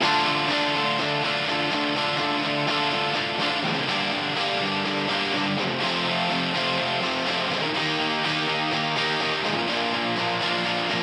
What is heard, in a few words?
Gtr B Mix